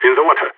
water

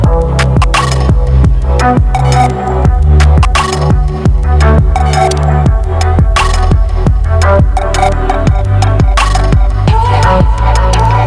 music

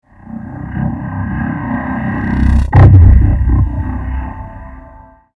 shockwave

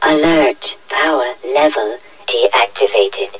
Power Level Off; zm dust MD

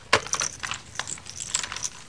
box out, weapons

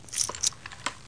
lsat chain, weapons

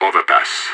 overpass; IDF

